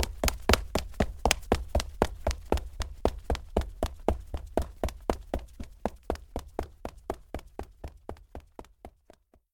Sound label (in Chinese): 快速跑开; 环境音; 非循环音(SE); Eustia